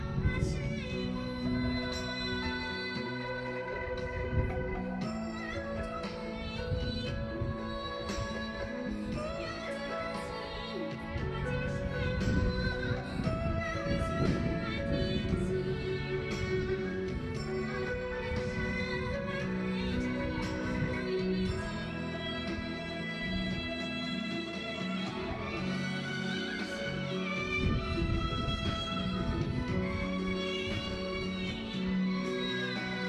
Chine folklore au parc de Shilin

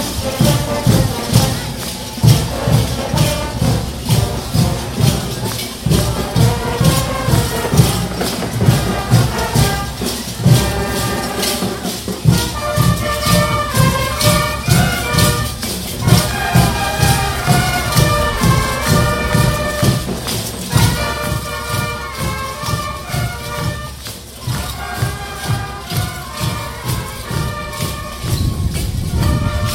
Sucre Danse pour le Défilé de la Vierge de Guadalupe; Bolivie

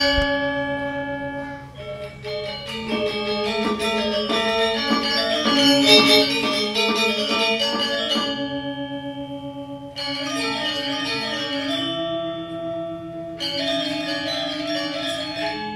Ubud Legong; Indonésie

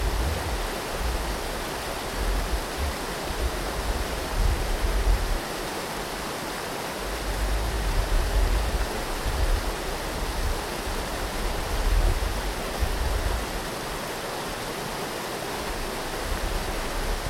Chili Altos de Lircay torrent

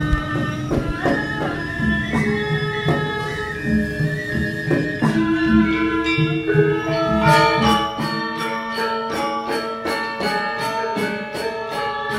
Ubud Legong; Indonésie

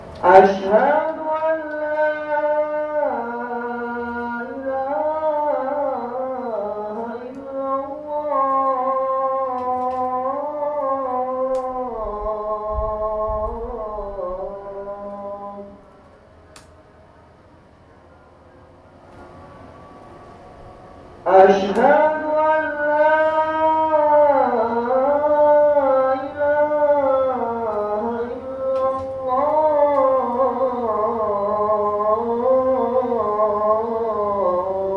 Jogja appel a la priere, Indonésie

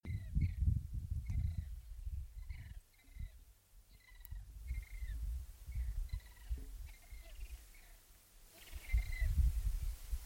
Flamants roses; Bolivie; Lipez

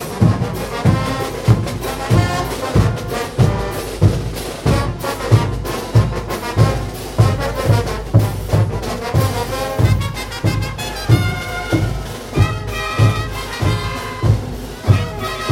Sucre fanfare dans la rue; Bolivie

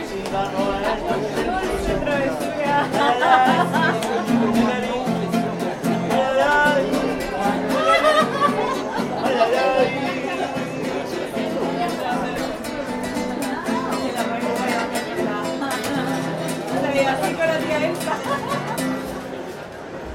Chili Santiago serenade

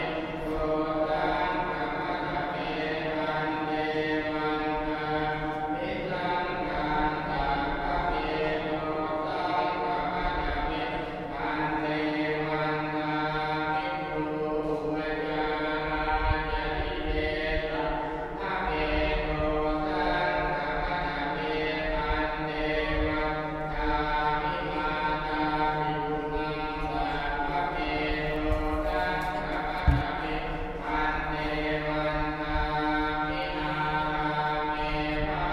Inde priere grotte d Ellora

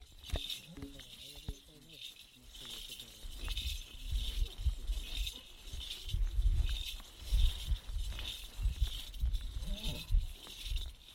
Inde aux pas des dromadaires

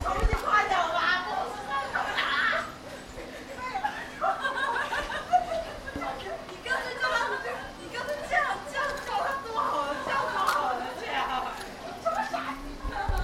Chine Xian fou rire

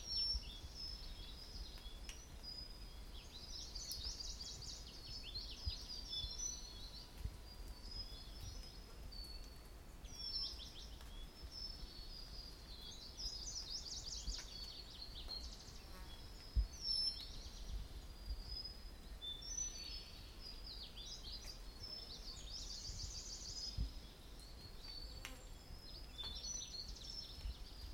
la forêt; Chine; Emei Shan

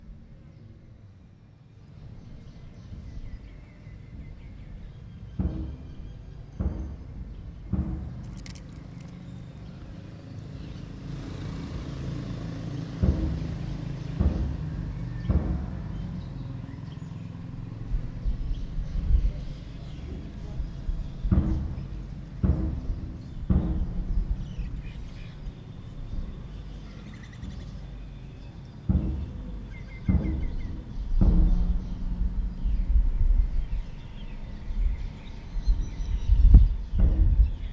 Laos Vientiane dans un temple gong de la fin du jour

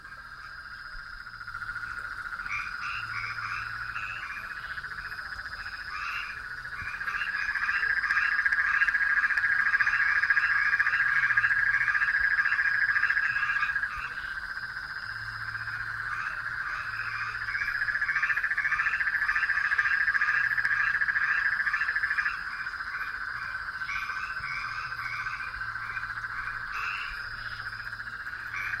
Concert de grenouilles, Bresil Paraty

